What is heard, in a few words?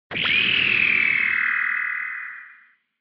Retro Anime Beam Low Long